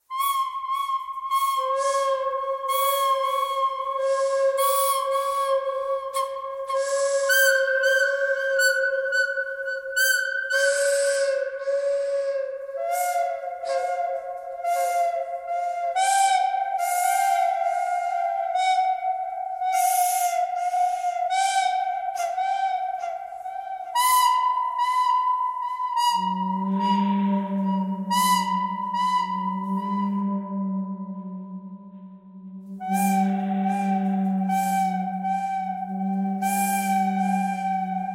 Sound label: bird canyon thread buzz